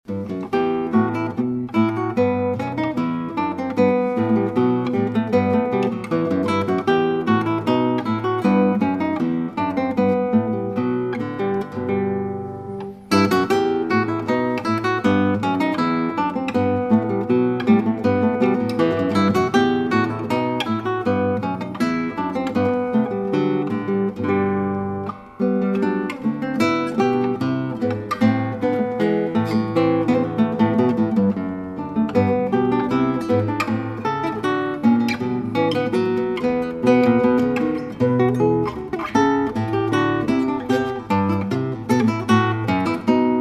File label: Bouree E Minor; Bach; solo piece